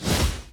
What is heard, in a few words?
Barier block; Folk Hero